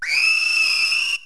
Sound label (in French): cri